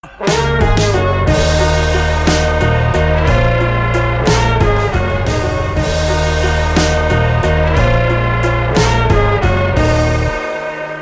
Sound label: trailer bgm ringtone; tamil ringtones; super deluxe; vijay sethupathi